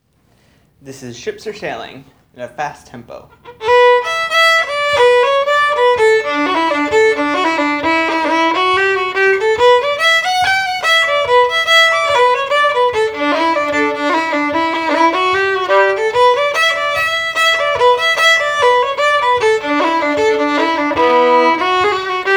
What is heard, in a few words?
Fiddle; Ships Are Sailing Faster